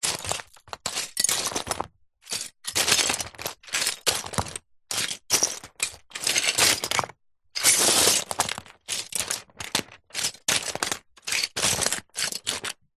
leisure toys lego pieces thousands walking footsteps barefoot slow